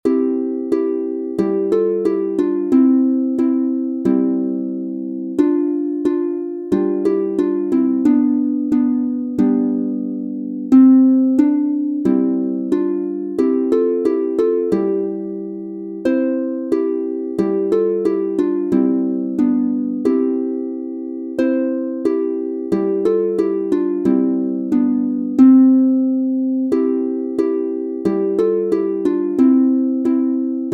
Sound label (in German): Lasst uns froh und munter sein C Dur mit Bass, Harfe